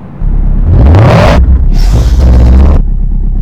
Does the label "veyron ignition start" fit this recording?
Yes